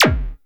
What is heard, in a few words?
Synth Blipper